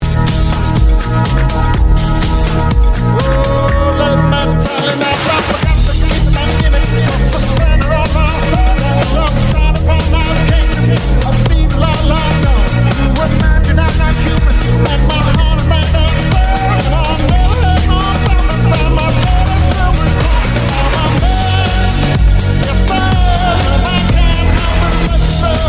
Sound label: electro swing